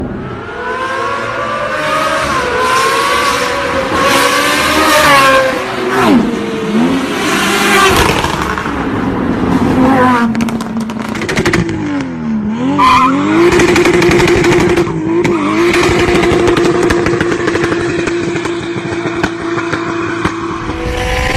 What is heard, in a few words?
Bike Exhaust Sound